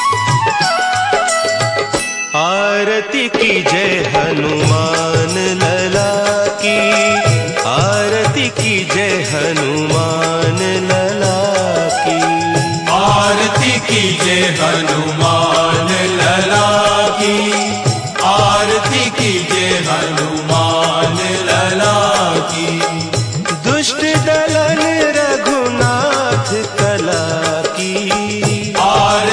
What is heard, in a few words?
Remix version Ringtone; Aarti ki jai hanuman lala ki